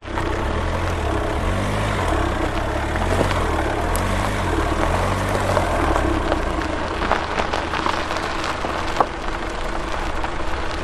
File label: vehicle car suv drive slow gravel road track close to tyres turning